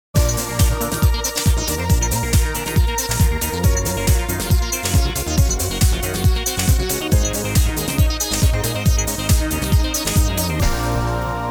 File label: loop